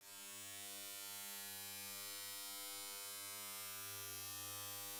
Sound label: comb